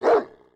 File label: Dog St Bernard; unsighted